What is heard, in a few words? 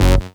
Synth